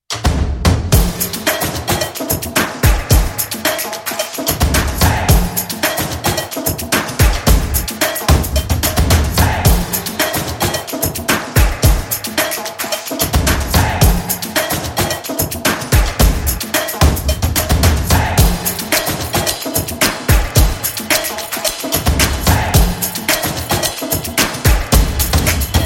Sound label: Maxie Music